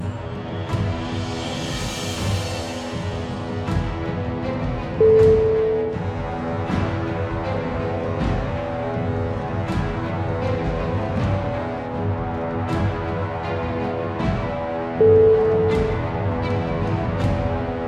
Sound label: suspense trailer